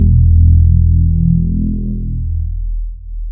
bass, synths

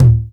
GROOVE TOM, Drums Dance & Groove; USB Soundscan vol.11; AKAI; GROOV KIT

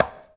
CONGA, Percussion; InVision Interactive; Percussion Slam; BOLERO

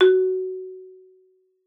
MAR F#4, MARIMBA; Xsample VOL-4

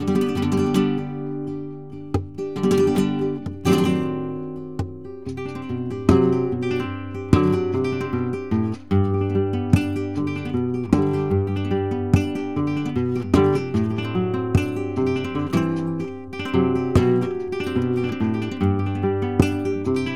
gta 150 g, Flamenco, Guitar, Alegrias

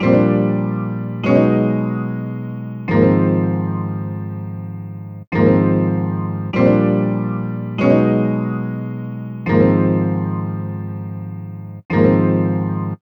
Keys, Cinematic Funk Urban Tone Poems; Sonic Foundry (Sony Creative Software); Session 05 - The Triad

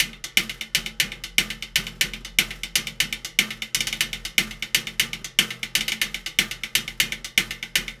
metal; Zero-G; Monster Beats

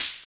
Hhat, HiHat; Equipped Music; Loungin House

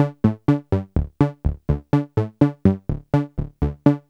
Syrinx, L; AMG - Vince Clarke Lucky Bastard

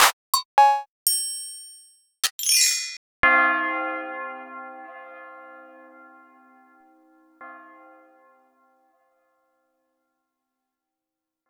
Roland Juno-D Perc Kit

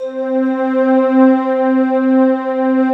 ARCHAICOL C4, Ethereal Atmosphere; USB Soundscan vol.13; AKAI

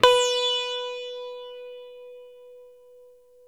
SITAR B 3, CORAL SITAR; East West; Steve Stevens Guitar Samples Collection

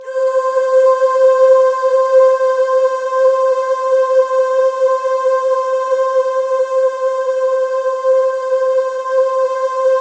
C5, Synth Leads; 90 s Sample CDs; NiceBeats (NiceBytes); Studioline 09

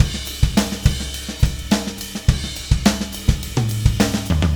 Beat Fill; Sonic Foundry (Sony Creative Software); Indie Rock Anthems; Session 02 - Clinical

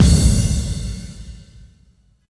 combi drums

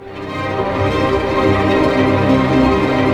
STR ARP MIN, Symphony Orchestra, STR Arpeggio; Roland LCDP08 Symphony Orchestra; STR Arpeggios

